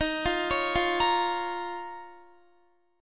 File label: Eb 7th Suspended 2nd Eb, Guitar; 4E,4A,5D,5G,5C,6F; arpeggiated